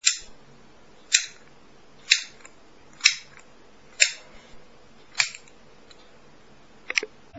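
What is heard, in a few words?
Small Scissors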